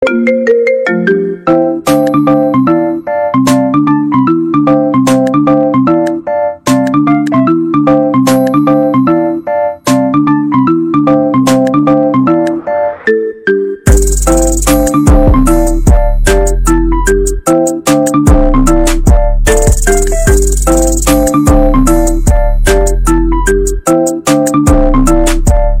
Marimba

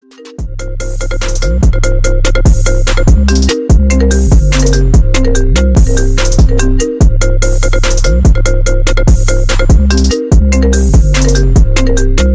Trap Remix; iPhone 13

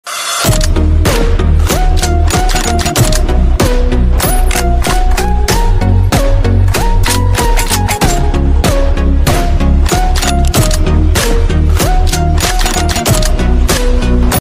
dance

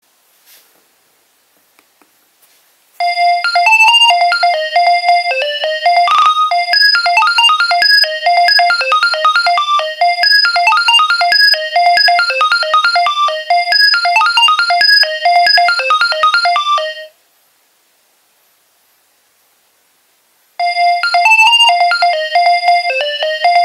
Nokia 2300 Ringtones

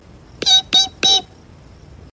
Beep

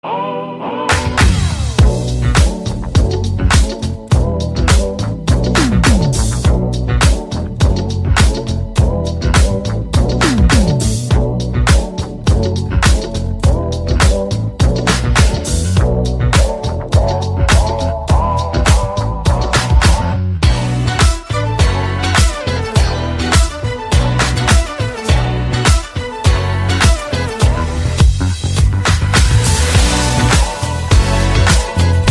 Instrumental

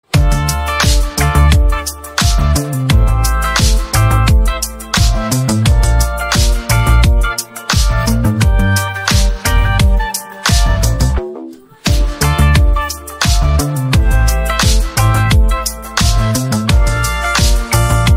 Instrumental

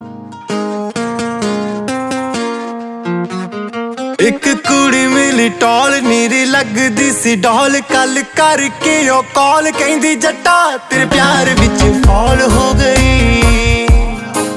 Punjabi Songs

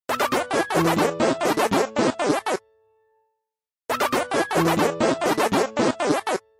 Pacman Waka Waka Nokia

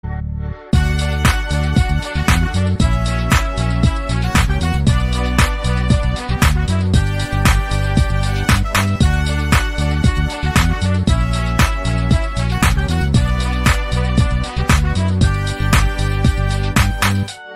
Remix Instrumental

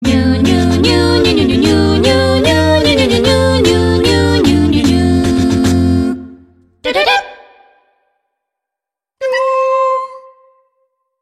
Sound Effect Acapella; Nintendo

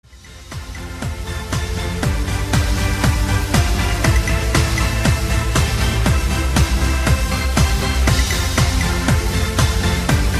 Instrumental

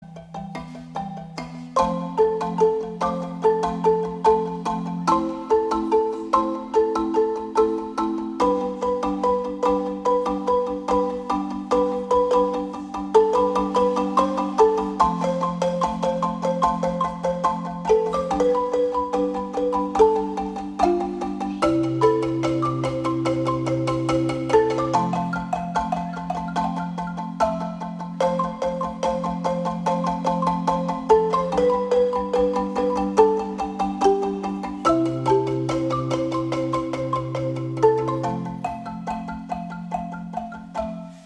Marimba

